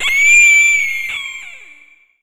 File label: Death